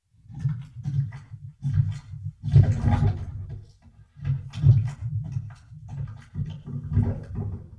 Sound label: bug bubble; GrandFantasia